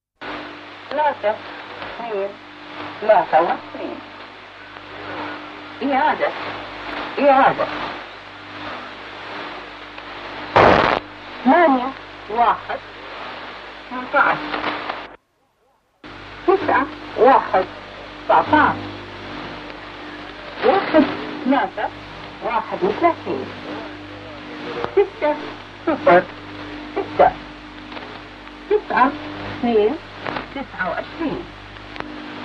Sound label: eastern music station different